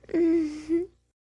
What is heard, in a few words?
Cuddle; Bedroom; Hilzartov; Fairy Size Doesn't Matter